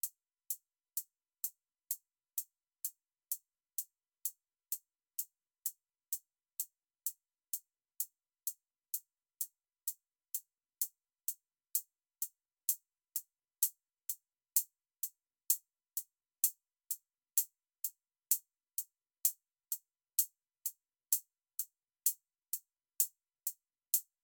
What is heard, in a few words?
HATS808 OH